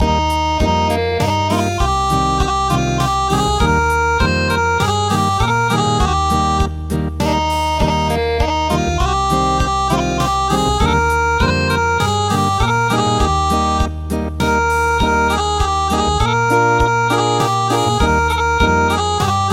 Acoustic; Heaven and Earth Rejoice Today

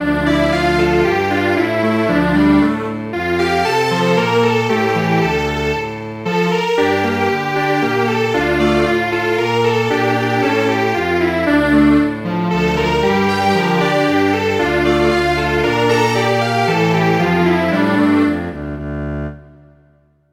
Strings Quartet; A new joy has been revealed

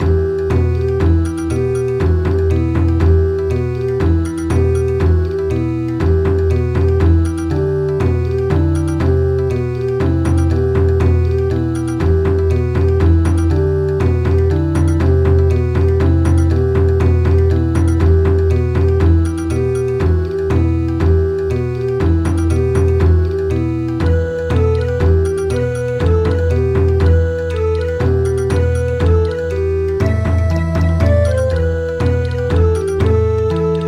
Bon Odori; Carol of the Bells

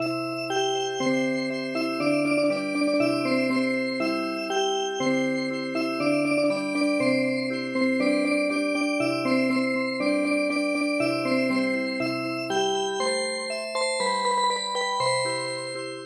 Music Box; Helan Gar

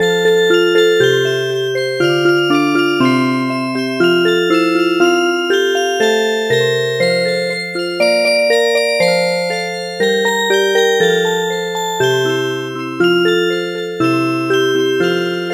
Music Box

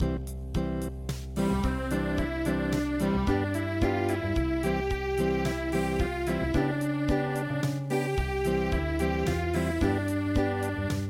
Unplugged; Wie lieblich schallt

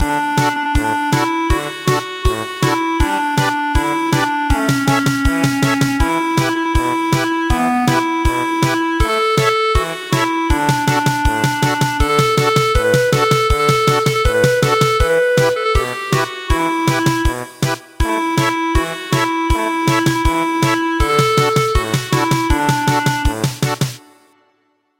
German Polka

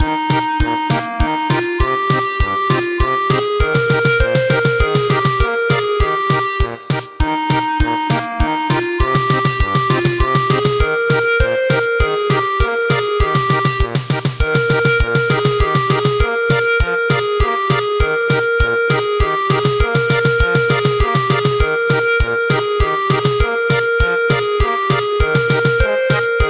German Polka; Heaven and Earth Rejoice Today

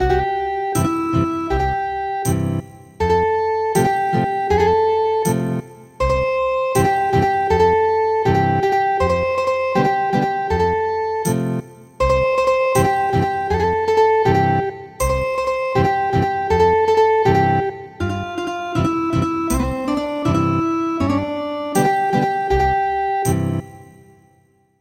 Ethnic Guitar

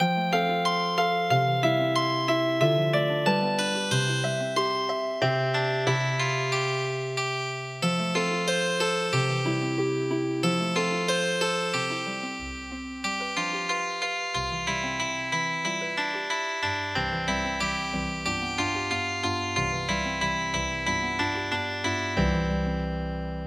Healing Harp, traditional; midi traditional; little fox

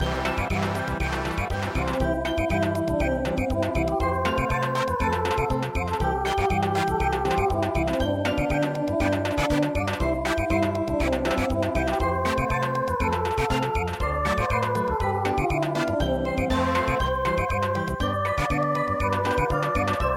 Samba; Click Go The Shears

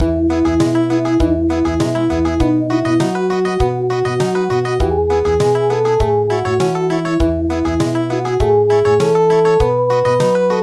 Country; Happy New Year Greece

